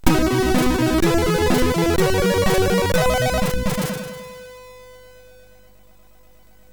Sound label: Bomb Chase Revival SFX, sid MOS6581